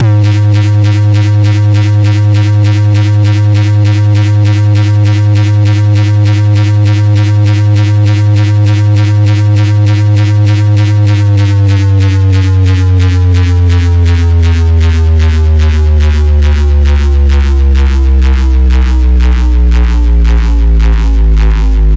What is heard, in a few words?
desecrated bass hit, evil bass sounds